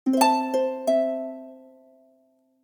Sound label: notification; OnePlus; One China